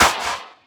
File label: Claps; Vengeance; Essential Clubsounds Vol.4